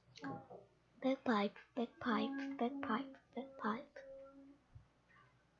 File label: bagpipe